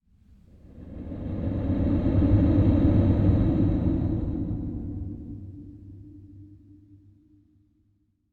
Horror Whoosh

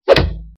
Block

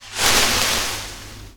Bush

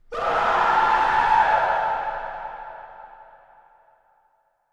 Shocking Scream

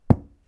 Dropping

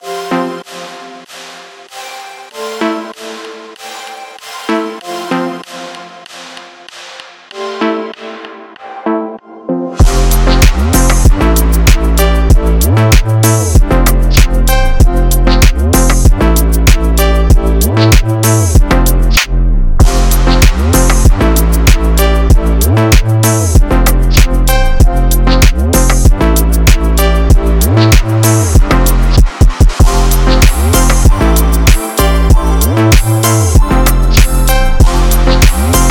Drift Phonk Beat

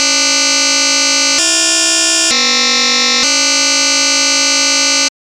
Glitch

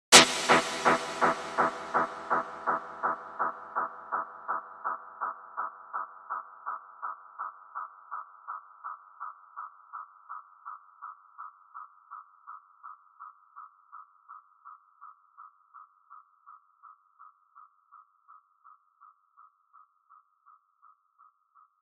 Machine Pluck Long

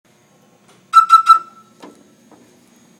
Forklift Error Code

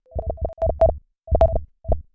Futuretro computing

